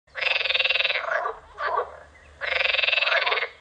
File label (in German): Quak